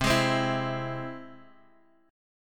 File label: E Augmented C, guitar